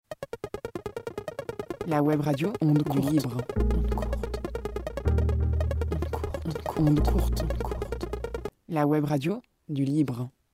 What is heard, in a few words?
jingle webradio